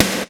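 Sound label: snare, DrumBase, KITS; Crypton - DrumBase5000; BABY KITS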